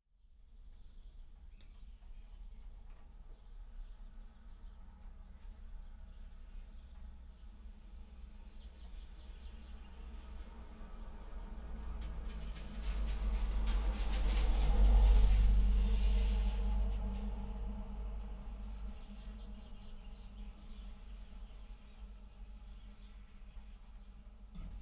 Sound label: phonebox; NorthEnd